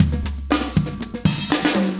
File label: 120, loops; Sound Effect Library